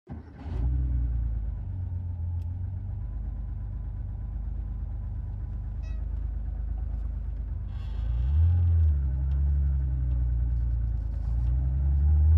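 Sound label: car driving; Звуки движения в автомобиле по трассе, шум во время езды с разной скоростью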